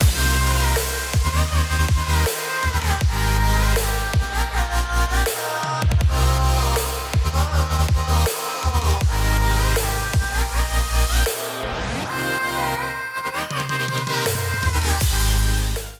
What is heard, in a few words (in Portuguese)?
Dubtep Musica Eletronica; Curso Masterizacao para Musica Eletronica